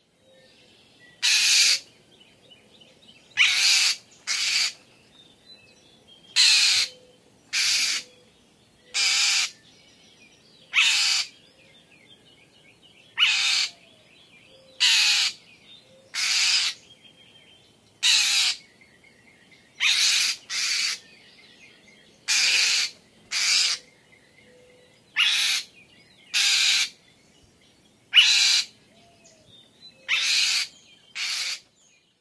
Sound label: NOMO mobbing owl; bird language; What the Robin Knows: Bird Language Audio Library